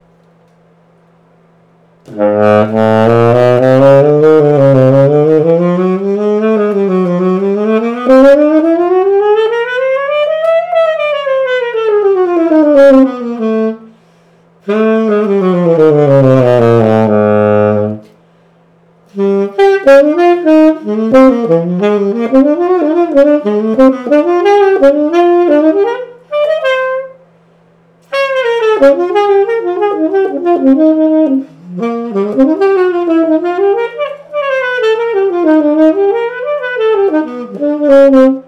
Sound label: Essentials Jazz Tenor 7 star, Mouthpieces; Theo Wanne